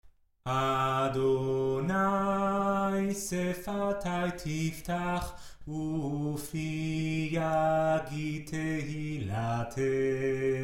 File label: adonai sfatai tiftach